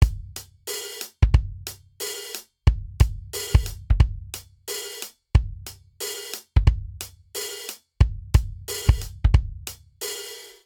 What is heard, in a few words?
Swing Hip Hop 01 Intro 90 bpm, drum; Ableton; Factory Packs; Drum Booth